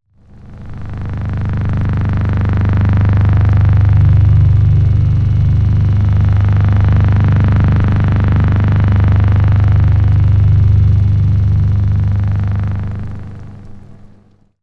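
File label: Siren; Boiler-Vibrating; noisy-boiler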